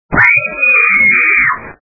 Scream Female

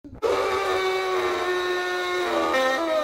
reaper, scary sounds

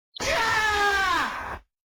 male scream

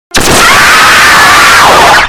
dancing girl scream

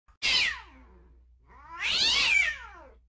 cat meows

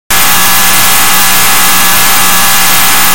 jump scare

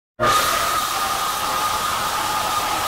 secret sound, scary sounds

